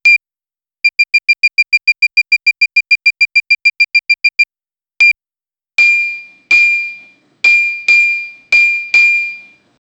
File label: cash register completion; retail